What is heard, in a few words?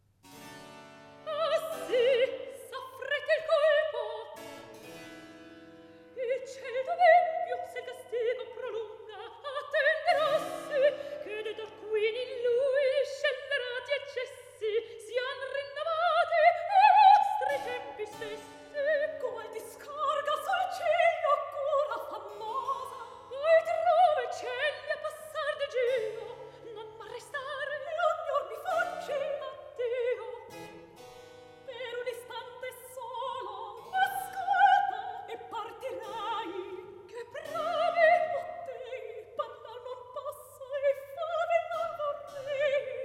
Recitativo; Ah si s'affretti il colpo; Mozart; Lucio Silla